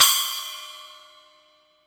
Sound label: SPLASH, Drum; East Collexion; Drum 1 Dry